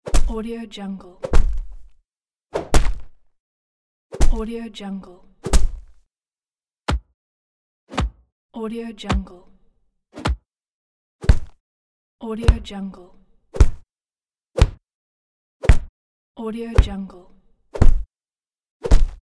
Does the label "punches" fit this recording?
Yes